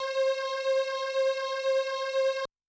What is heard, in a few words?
STRNG; Yamaha DTXpress-II